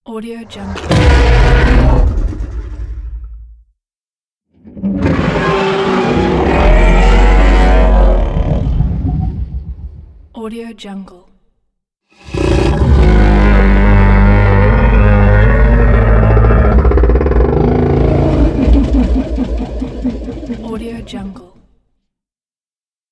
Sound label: trex dinosaur